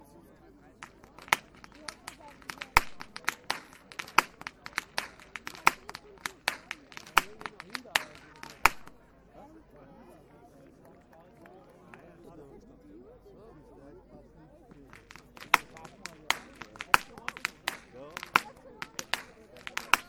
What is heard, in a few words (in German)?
schnalzen, jugend